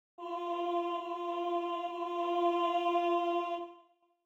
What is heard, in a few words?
alto